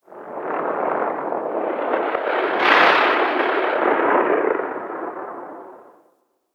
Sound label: Gas City Ext Distant Air Rip; Ambience; Warframe; Corpus Gas City